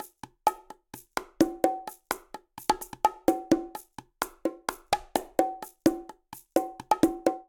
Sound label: Latin Fusion Shaker Bongo 128bpm; Latin Percussion; Ableton; Factory Packs